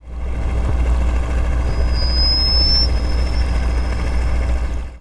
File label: SONAR